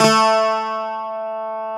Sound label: Greek Bazouki A2; Multisamples; Digital Sound Factory Planet Earth